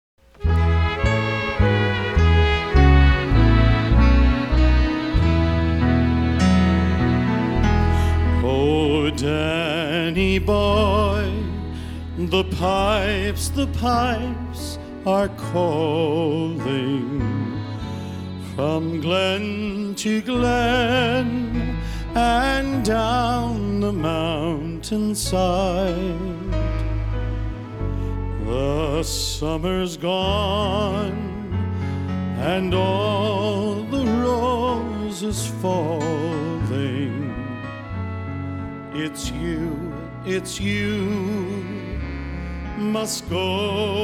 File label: Ballad